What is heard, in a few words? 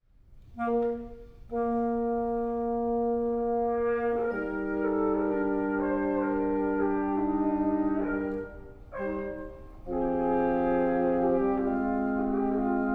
chamber jazz